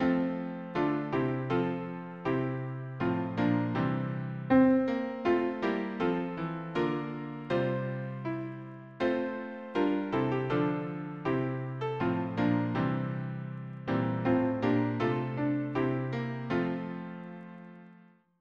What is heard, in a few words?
harmony piano